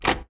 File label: door unlock